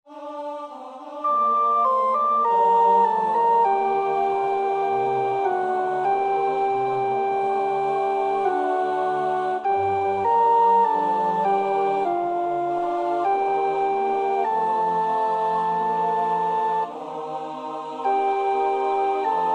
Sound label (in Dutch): sopraan, koorpartij oefening